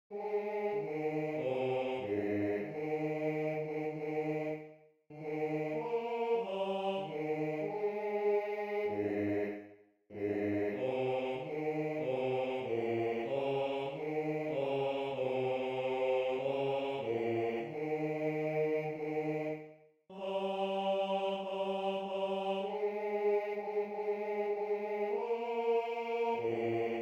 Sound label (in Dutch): tenor bas; Piu non si trovano